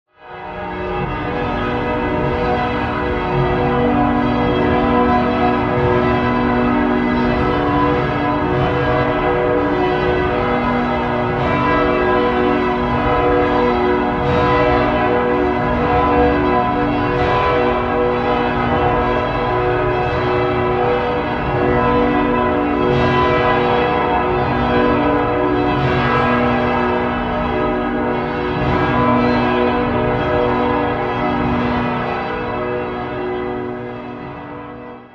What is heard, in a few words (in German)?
glocken; markuskirche